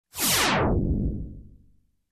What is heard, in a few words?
Futuristic Laser beam